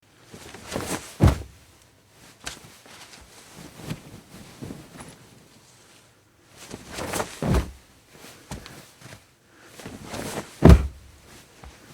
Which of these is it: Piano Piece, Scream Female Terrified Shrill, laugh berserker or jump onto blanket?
jump onto blanket